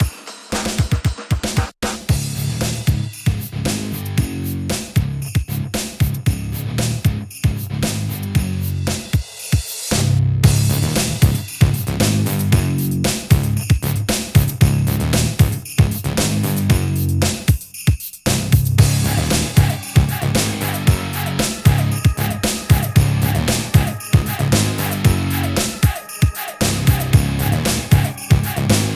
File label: Instrumental; Demolition